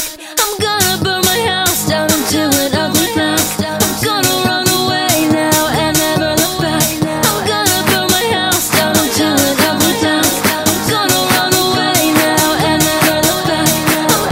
Vocaloid

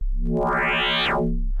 Flashback Warp

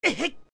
Adult Hurt

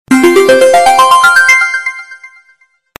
cool message tones; ringtones; sms

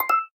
Google Glass Done

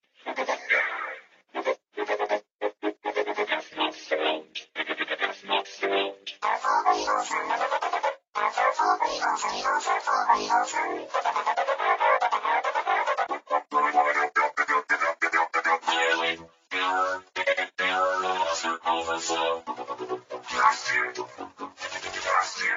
Rare Wubbox sound

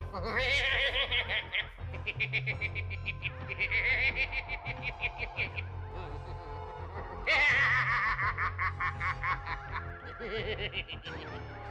Laugh; Skeletor

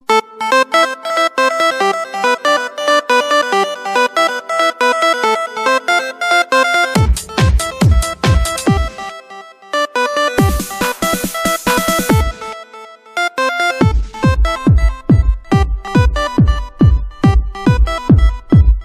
SUPER DISCO

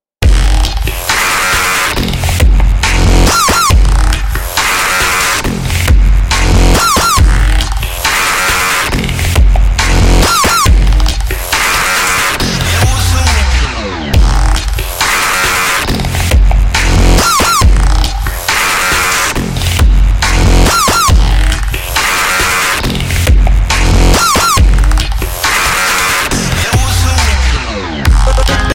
Loud Dubstep

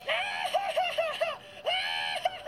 Laugh; Vash

